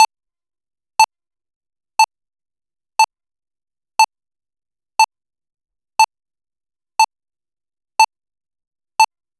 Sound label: short cute 8 bit, mascot